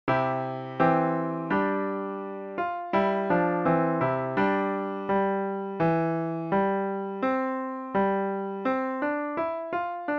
trumpet tune

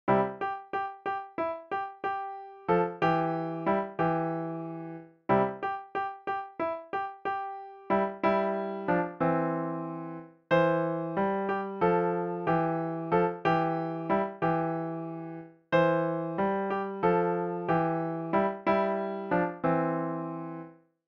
piano solo

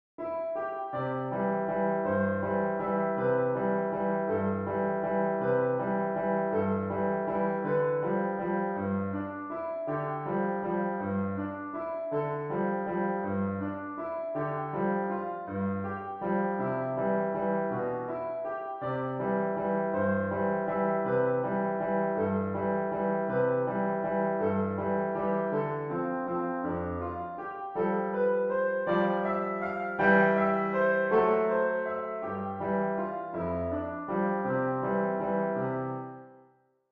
je te veux piano

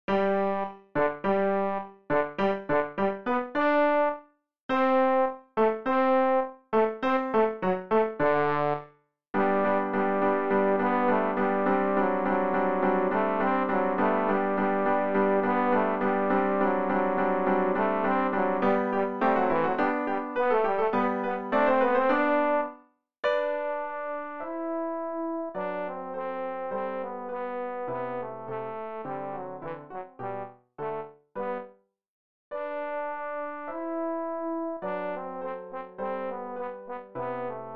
trombone

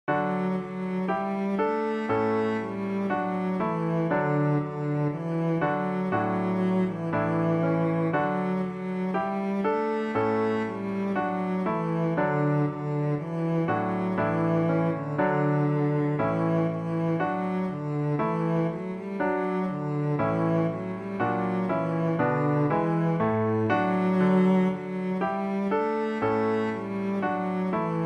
cello

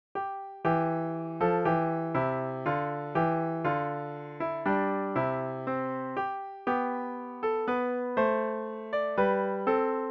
we gather together piano

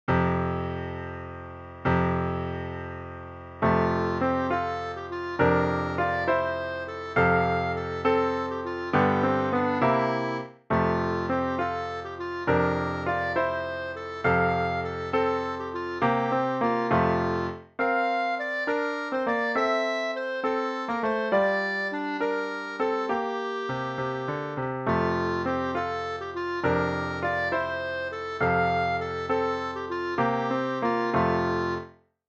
dance clarinet

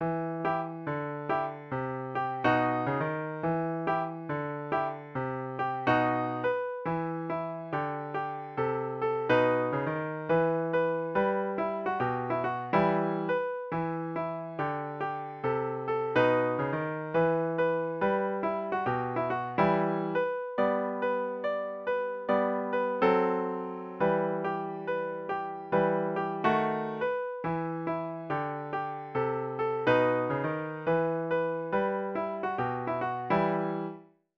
piano

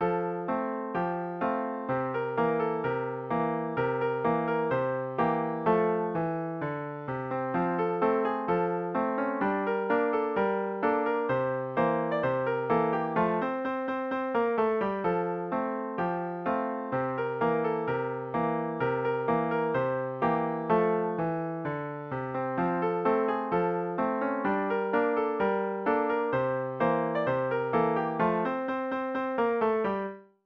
john jacob jingleheimer schmidt piano

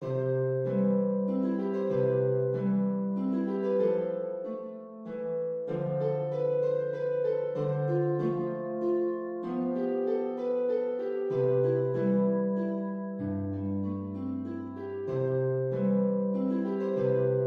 waltz harp; musettes

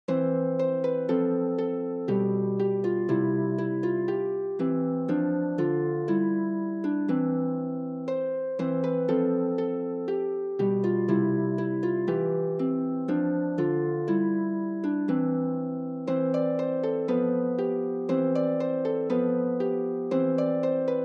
country gardens harp

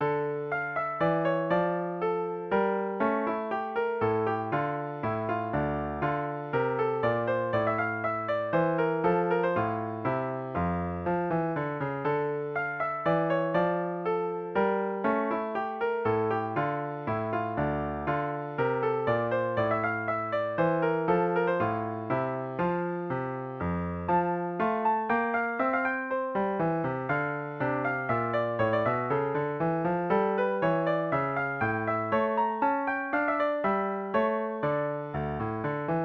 minuet in d minor piano

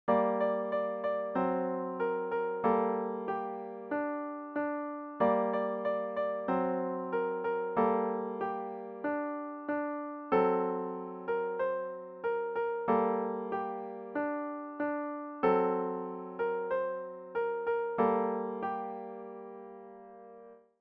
piano

